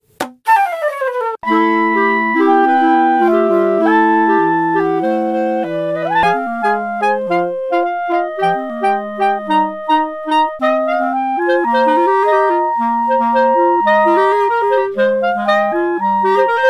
Clarinet and Flute Colours

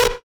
A- Synth Shot

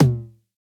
909 Tom 90s Hit

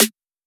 Snare Shot

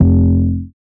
808, F

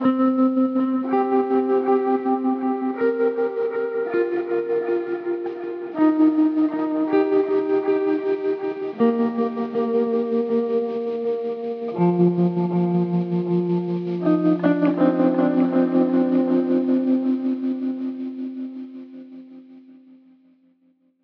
Melodic Guitar Loop 80BPM

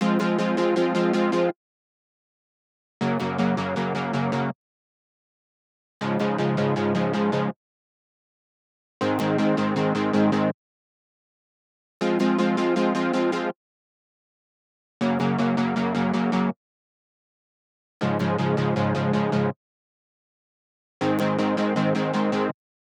Processed, 80bpm, Stab Melody

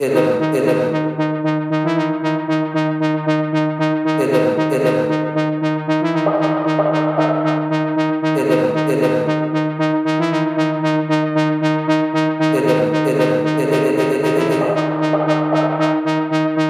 115 melodic stack nasty Ebmaj

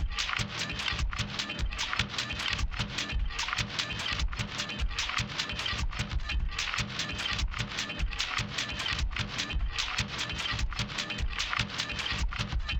75 Trash Perc Loop

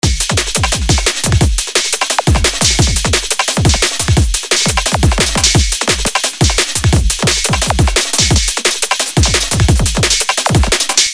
DRUM & BASS DRUM LOOPS & ONESHOTS

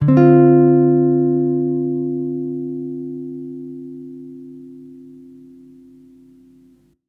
Arpeggiated C Major 80 BPM

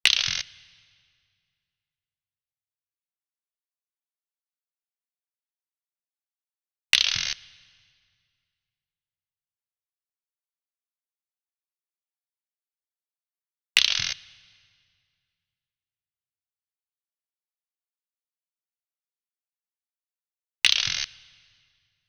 Whoa, 140bpm, F min, Perc

